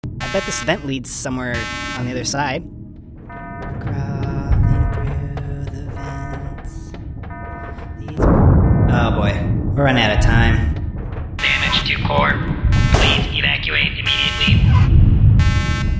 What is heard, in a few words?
Vent (Door's Stuck); Completing The Mission